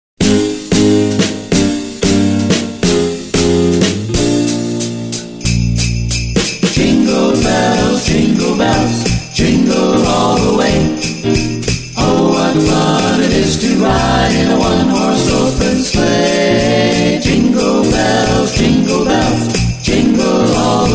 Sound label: jingle